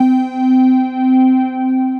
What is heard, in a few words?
Oscillations, Soaring Leads; Ableton; Mood Reel